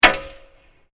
METAL STRIKE; Materials

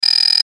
Alarm Harsh Loop; Production Element Imaging Element Accent Transition; Alarms (Sound Effects:Elec; SPECIAL FX

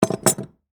Skeleton Key Down, Set; Foley; Jangling Sound Effects; Objects; SoundEffects-BlastWave

